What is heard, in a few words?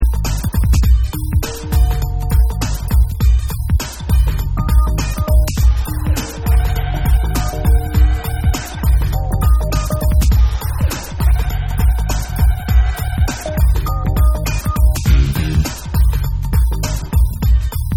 T Wah Mix